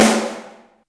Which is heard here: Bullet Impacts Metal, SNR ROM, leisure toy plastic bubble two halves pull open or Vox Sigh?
SNR ROM